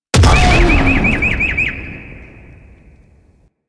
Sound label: Arm Destroy Explosion; Enemies; Warframe; Eidolon; Grn Bomber